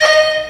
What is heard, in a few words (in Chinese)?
乐器 D#6; 潮汕英豪传